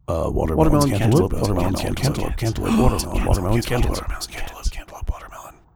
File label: Crowd noises, stacked; Judge John Hodgman